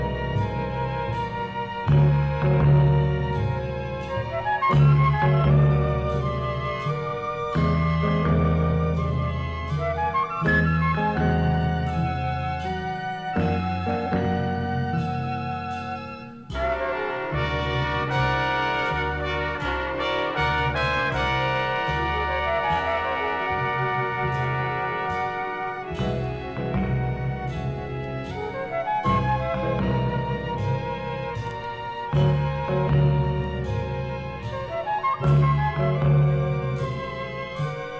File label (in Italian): Flauto In Suspense